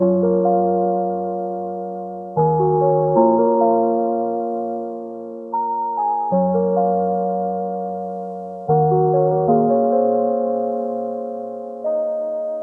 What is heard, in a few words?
76 BPM A# Min Synth Keys, Lofi; Melodies; Loop Stems; Cymatics; Lofi Starter Pack; Misty Eyed